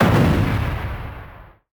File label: explosion; CrossCode; battle